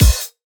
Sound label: kick one shot, drums; work; Slate; SD PASADENA Pop Sample Pack